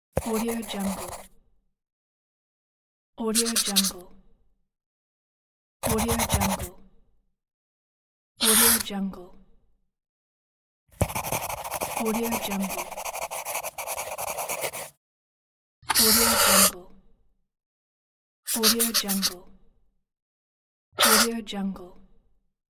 pen

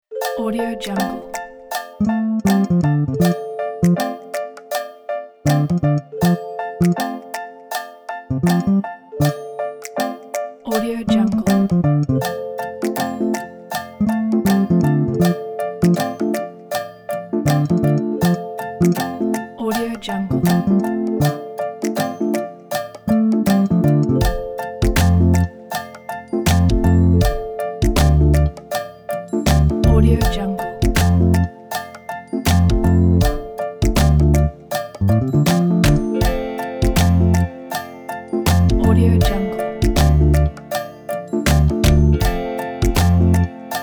spring sales

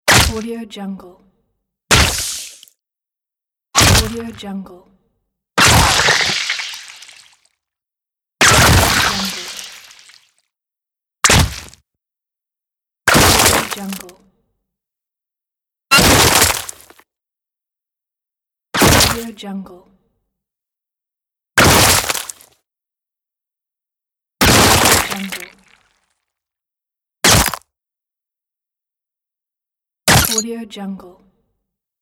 bullet hit set

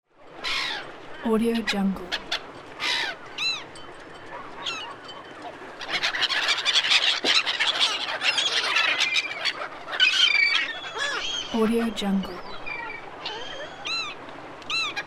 waterfowl ambience

